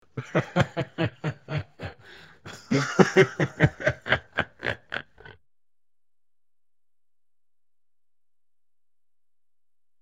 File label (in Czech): zvuk smich